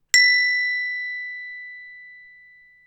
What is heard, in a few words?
bell